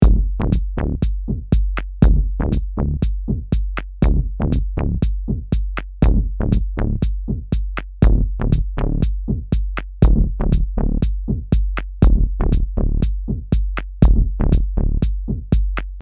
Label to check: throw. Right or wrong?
Wrong